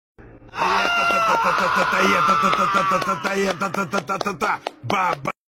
parovozik

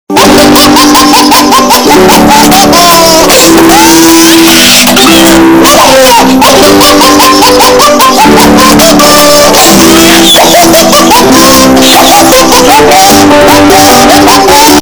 smekh rebenka

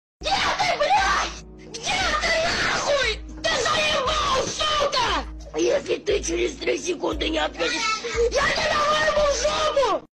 krik shkolnika

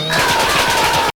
kogo to izbivaiut

